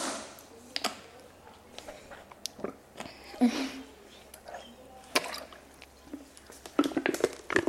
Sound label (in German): aus einer plastikflasche trinken